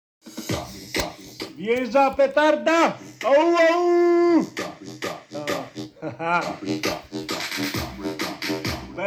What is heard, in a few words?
hehehehe